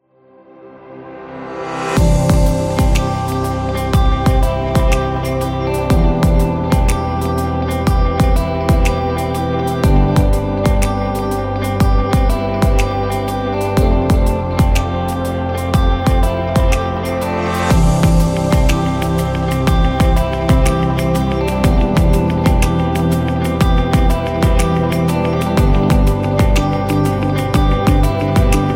Warm; technology

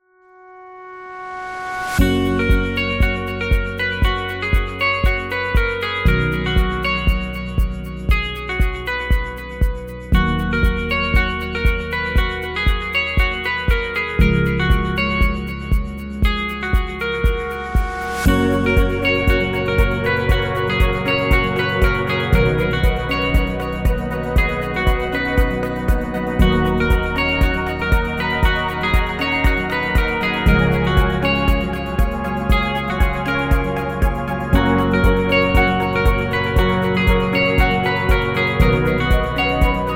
Corporate Plan, corporate music; corporate music vol 3

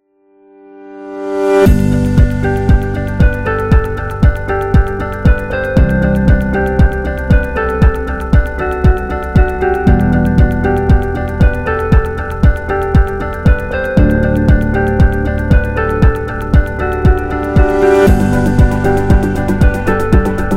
Corporate Time, corporate music; corporate music vol 5

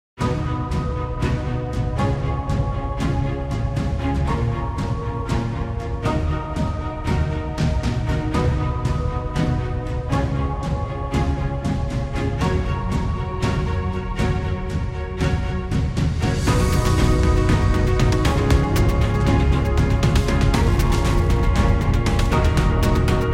Epic Corporate; positive vibe

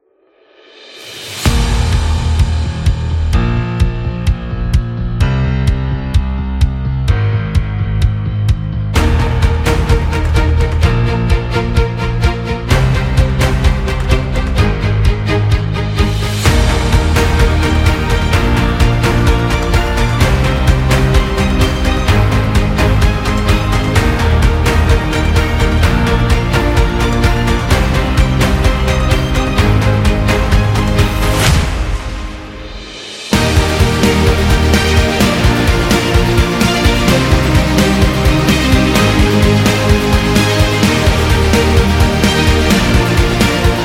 Action

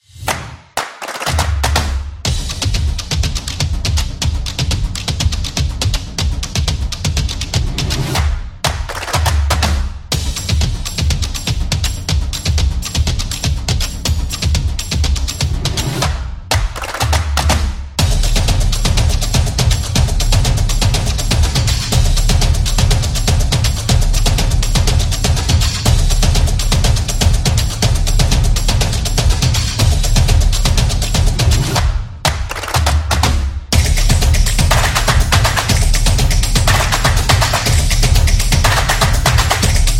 Clap Intro

